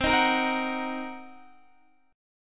C Minor 7th Flat 5th Guitar Open D, chord

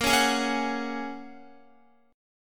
Bb Minor 7th Sharp 5th, Guitar; x,13,13,13,13,14; Bbm7#5 strummed; JGuitar

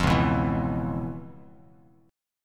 Db 7th Sharp 9th Db, down, Bass Standard; strummed; JGuitar

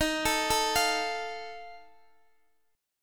Eb Suspended 2nd Flat 5th Eb Guitar Open D

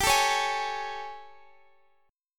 A Major 7th G

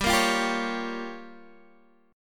G Minor 7th Flat 5th, Guitar, Half Step Down

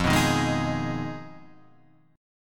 F Minor 7th Flat 5th F down Guitar Half Step Down; Fm7b5

